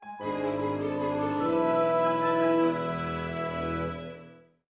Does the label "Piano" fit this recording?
Yes